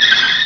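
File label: tire squeal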